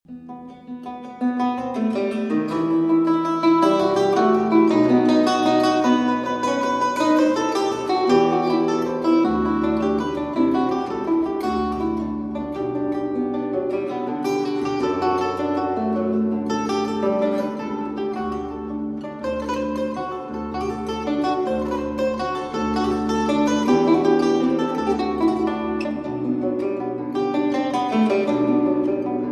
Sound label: Gigue, Zither